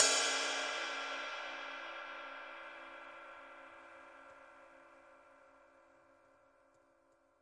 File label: big ride bell; single hits; Big Believable Rides